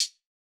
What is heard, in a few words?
hihat; Pop